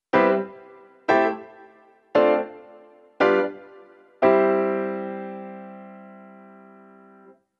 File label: Cmaj7 Am7 Dm7 G7 C